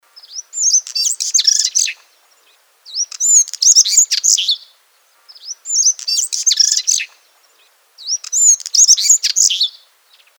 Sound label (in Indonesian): suara decu batu